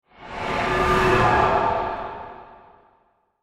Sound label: Science Fiction Spaceship Pass By Whoosh Flange; Spacecrafts